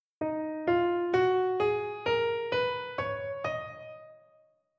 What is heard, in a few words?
eb aeolian natural minor; Fire Emblem Fates